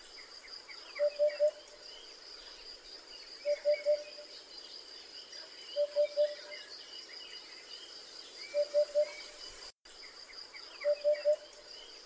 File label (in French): huppe